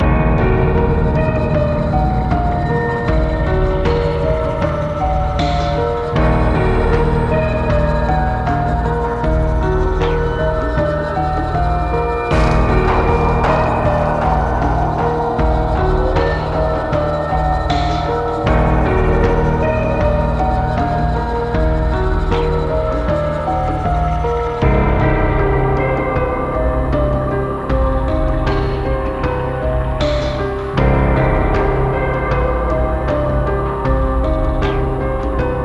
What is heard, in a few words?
Suspense loop